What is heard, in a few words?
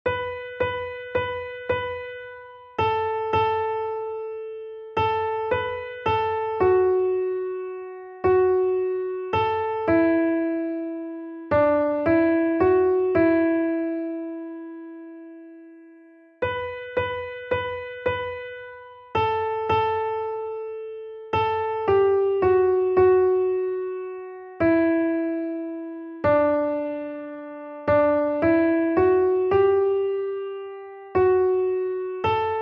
melody; o god how good thou art